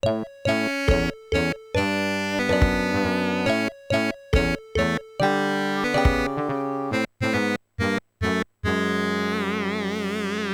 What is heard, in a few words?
Game Over